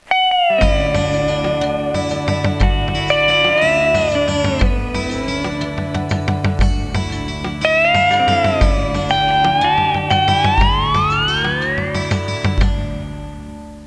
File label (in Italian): suonerie musica hawaiian